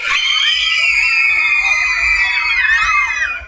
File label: Silly Girls